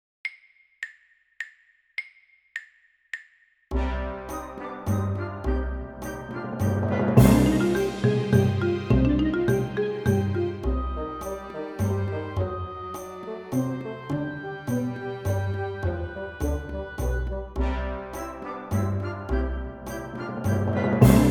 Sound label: MOUNTAIN CLIMBING Perf, piano